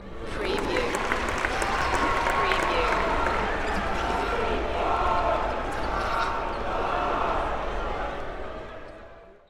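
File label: Arena Crowd Cheer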